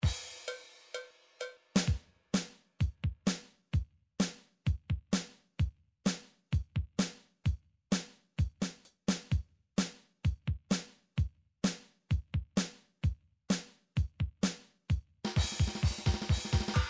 drum midi files rock

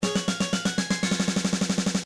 Robot Master Defeated; Game System Gameboy; Mega Man 2

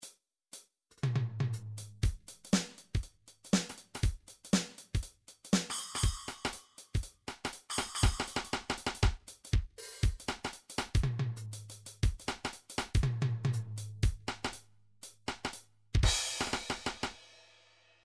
drums reggae, midi drums

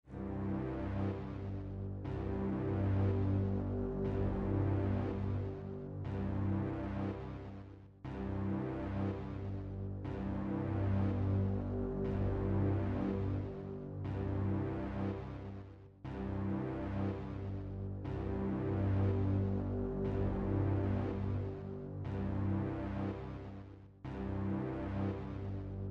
game over; gameboy advance; metroid fusion; takeuchi yuka

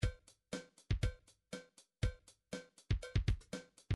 drums funk rock, midi drums

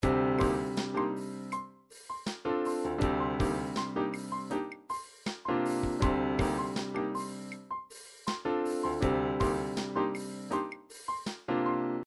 triphop; Newtronic Triphop Construction Kit

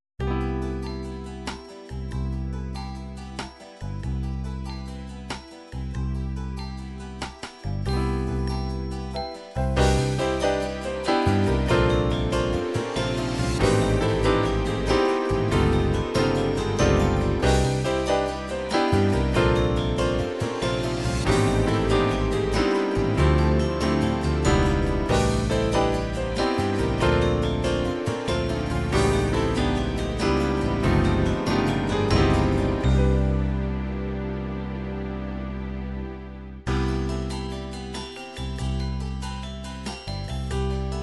psr9000 pop waltz, midi

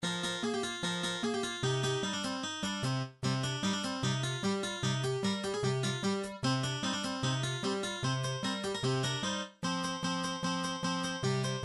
gameboy camera song, midi; game system gameboy

